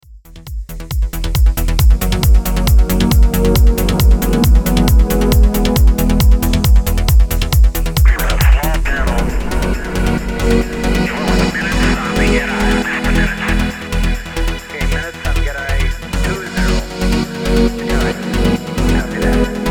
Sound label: no high pass, electronic productions; reducing mud